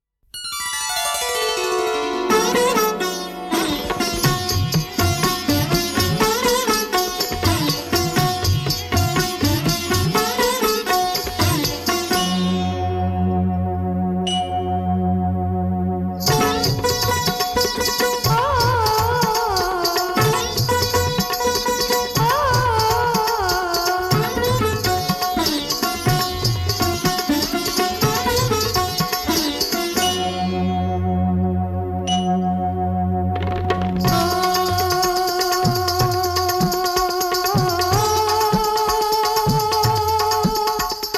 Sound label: Dance Music; Ghungroo